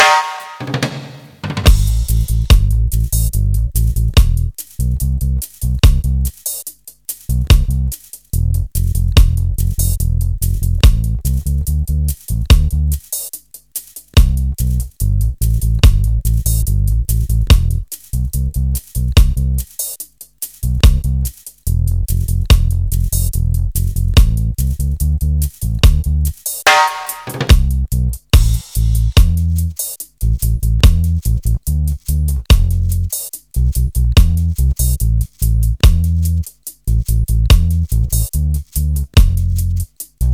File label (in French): Basse Batterie 144 Bpm